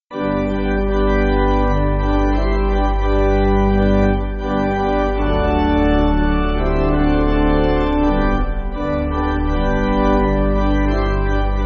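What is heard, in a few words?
Pipe Organ, church backing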